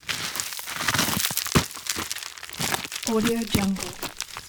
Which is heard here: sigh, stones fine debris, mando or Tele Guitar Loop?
stones fine debris